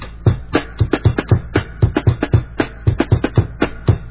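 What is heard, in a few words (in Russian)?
барабанный луп танцевальный олдскул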